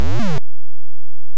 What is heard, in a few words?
Coin; Grobda; PC-8801